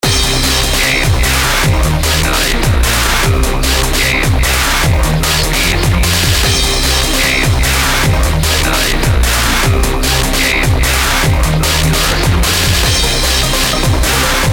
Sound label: hard dnb